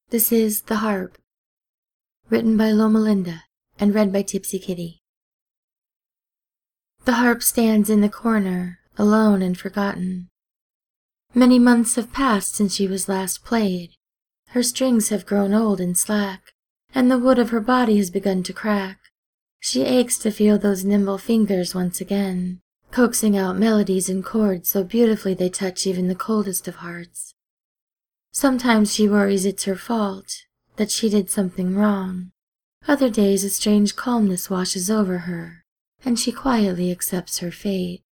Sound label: The Harp